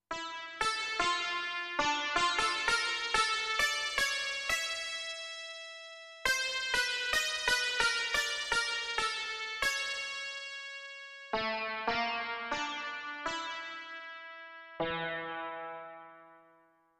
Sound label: Detuned Sitar, Yamaha Clavinova CLP-270; ETHNIC; 01. / Detuned Sitar; I SUONI - GLI STRUMENTI XG